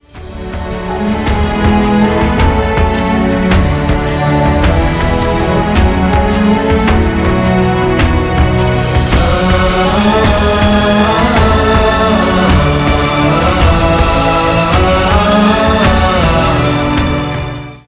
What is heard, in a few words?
Instrument